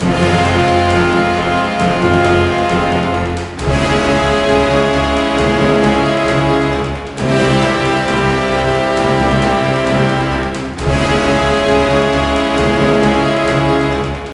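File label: Ambient Music Loop; Ghostbusters The Video Game; NDS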